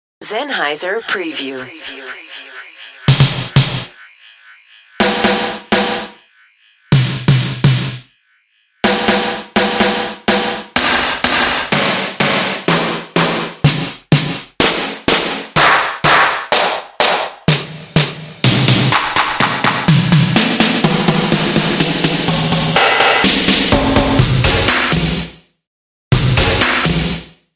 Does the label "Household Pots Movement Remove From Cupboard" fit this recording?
No